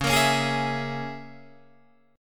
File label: Db Minor 6th Db down Guitar Open D